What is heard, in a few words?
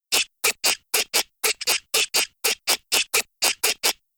Happy walk